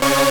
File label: synth; osu